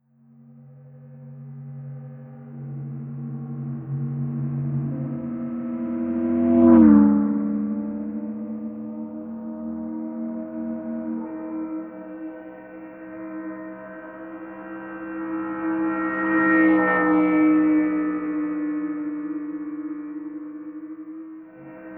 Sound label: SPACE CAR, Anime Sound FX FLYOBJECTS